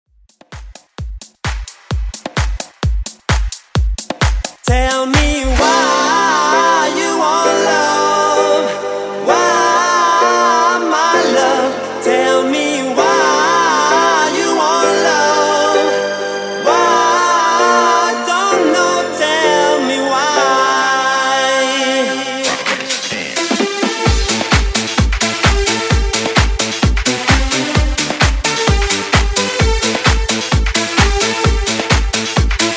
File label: Italo Dance Redrum 130Bpm